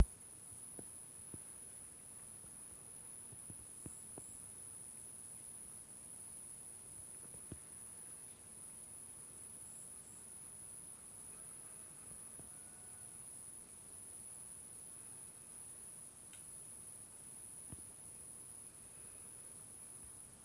ninh binh silence

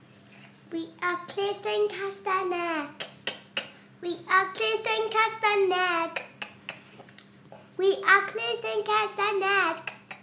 clicking castanets

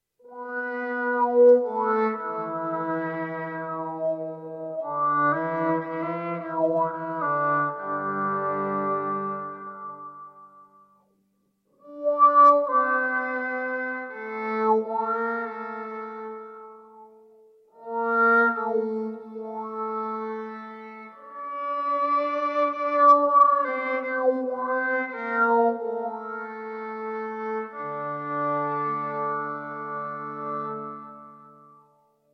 wah violin

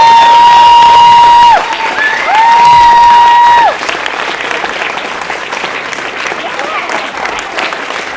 crazy huge applause; The Yes Men

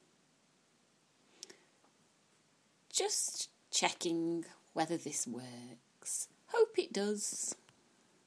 grrrr

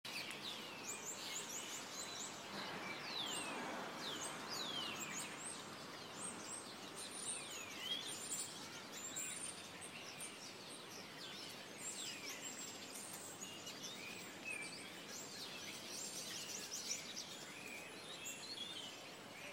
crazy birds birdsong

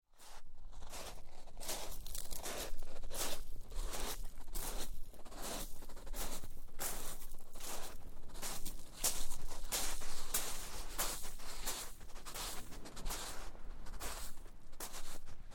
walking along stony beach; at ringstead

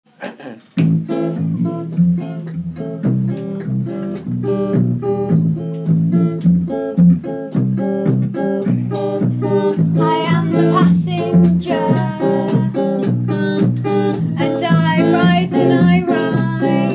reggae

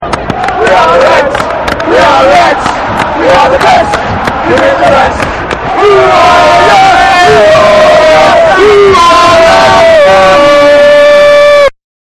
reds chant

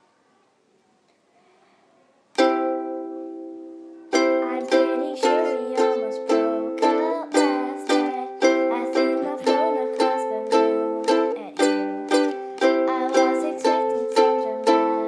ukulele

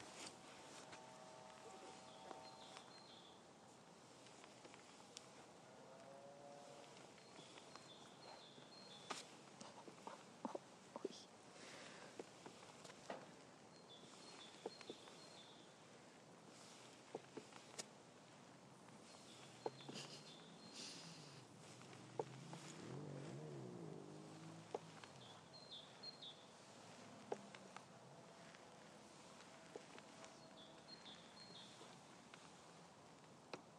birdsong and trying to get a dog to woof at us

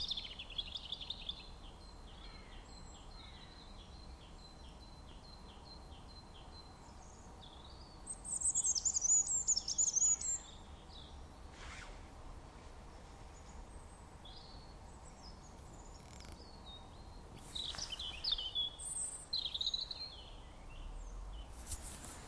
birdsong

